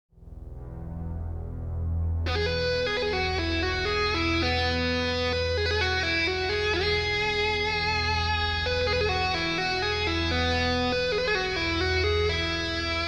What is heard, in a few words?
Instrumental